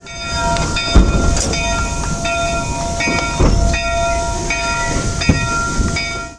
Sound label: bells Sound